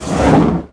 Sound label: flamethrower end; weapons